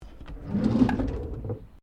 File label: Drawer Interior Wooden Kitchen Large Open; Various domestic drawers; Household